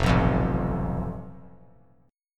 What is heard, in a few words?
F Major Flat 5th; FMb5 strummed; JGuitar